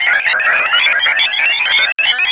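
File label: sphere blips large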